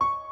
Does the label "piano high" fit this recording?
Yes